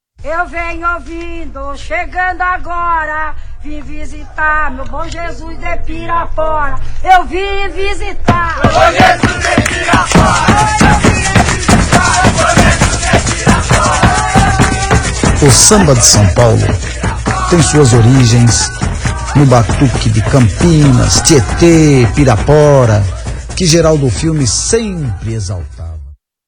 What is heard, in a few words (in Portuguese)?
Vinheta Batuque; Batuque de Pirapora; São Paulo dos anos 30